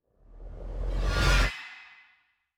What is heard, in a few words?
shock reverse; Joe's Diner